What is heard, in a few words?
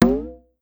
Conga Hi Up Slow, Pitch Env; Clavia DDrum-AT; Pitch Env Various